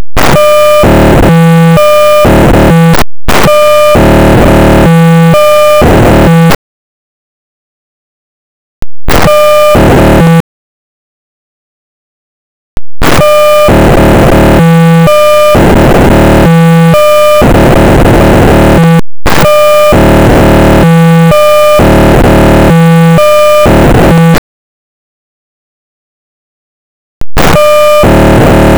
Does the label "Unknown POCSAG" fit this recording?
Yes